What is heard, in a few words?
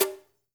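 brush conga, right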